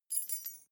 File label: key ring mid size fly tinkle; objekte; schluessel